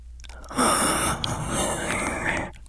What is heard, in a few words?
breathing, infected